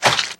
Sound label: splat